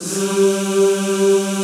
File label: ZZZ G2, Classical Choir; Best Service; Extended Classical Choir